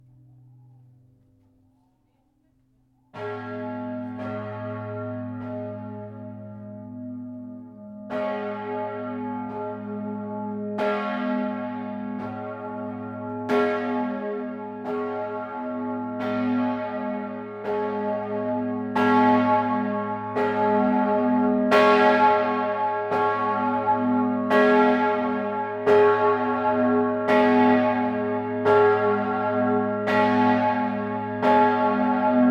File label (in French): Lausanne cloche; campanologie